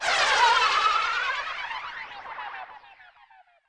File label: warp; moonchld